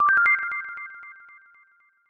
ominous cancel

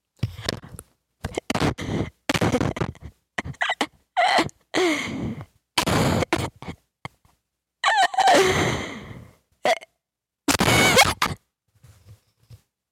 hahhahahahhahahaha

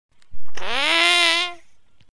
fart

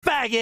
faaaaaahhhggg

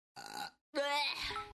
burp

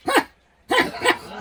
grunt

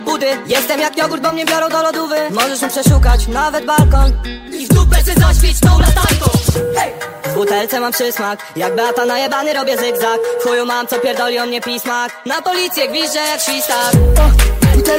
nightcore

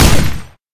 dual machine guns; pixel gun 3d

